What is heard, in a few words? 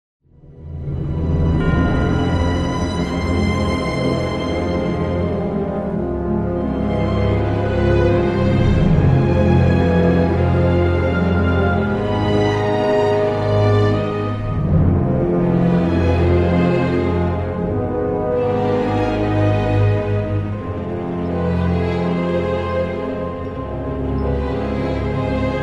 campfire; tangled